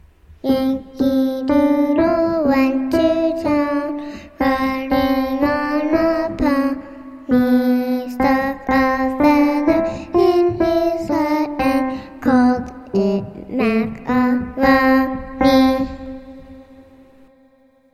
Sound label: Yankee Doodle Vocals